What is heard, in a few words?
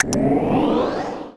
powerup